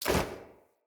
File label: Generic Weapon Light Aim Transition Out